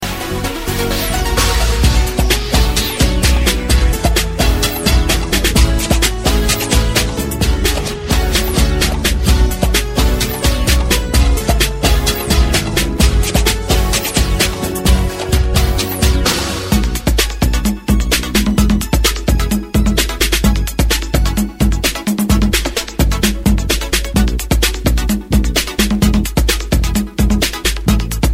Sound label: Funk Style